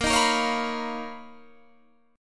B Major Sharp 11th A sharp

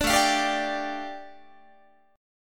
A 7th Suspended 4th Sharp 5th A, Guitar; A7sus4#5 strummed

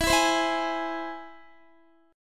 E Augmented, Guitar; strummed

